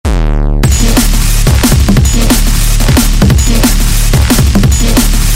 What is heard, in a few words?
Fast Bass Tone